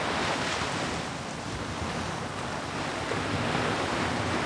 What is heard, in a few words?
loop; Math Mysteries; Tom Snyder Productions